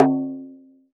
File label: Dof Rim, Roland BK-5 Percussion